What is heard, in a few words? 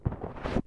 weapon switch